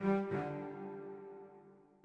orbit cello; Sky Children of the Light gamerip

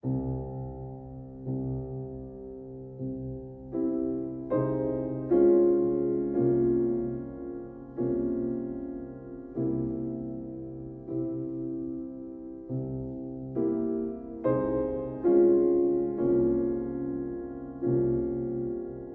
Regrets Mix Piano; Get Even

